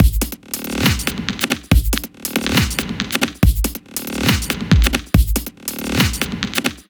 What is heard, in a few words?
drum loop, Dubstep; Dubstep Sample Pack